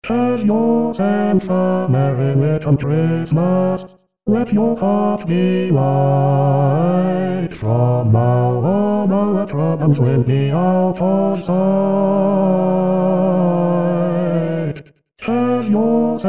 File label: Bass